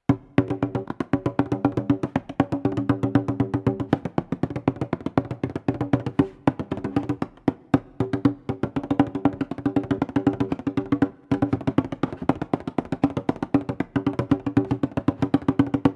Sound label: bodhran